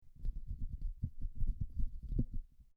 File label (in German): Hand Audio Technica ATM 610a